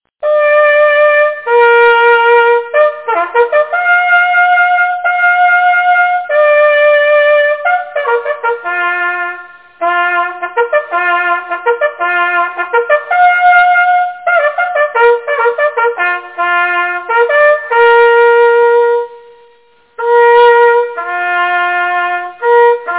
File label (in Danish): vildanden, jagthorn